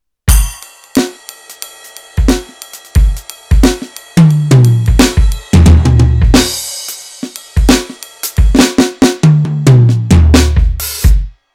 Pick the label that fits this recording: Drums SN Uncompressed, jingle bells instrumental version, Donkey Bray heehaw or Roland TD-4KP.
Roland TD-4KP